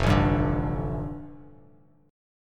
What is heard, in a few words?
F 6th Add 9th F down Bass Standard, chord; F6add9 strummed